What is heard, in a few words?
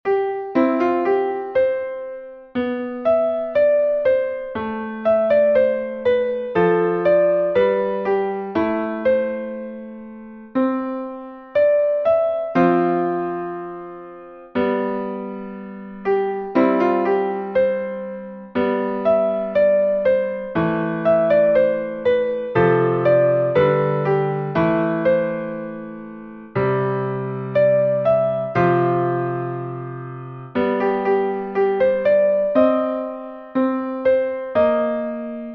Easy Piano